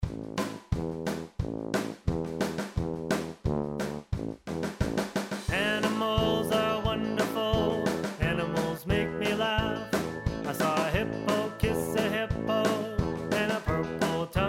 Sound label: Practice Vocals